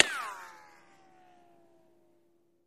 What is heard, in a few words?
Bullet Ricochet Sin